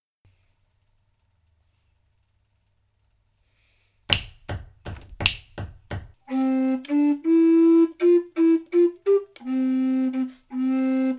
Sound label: allegro; Loeillet